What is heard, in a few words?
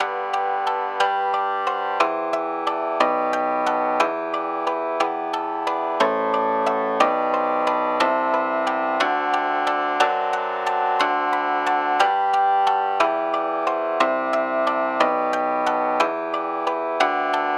shamisen